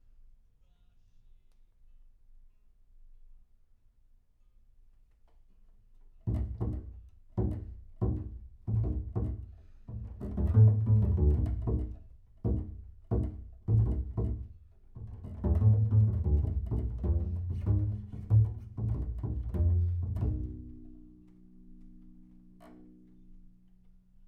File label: Bass Room